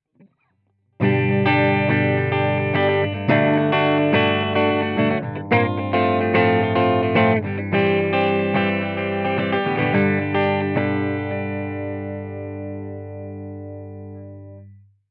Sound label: clean no bright middle; brunetti pirata141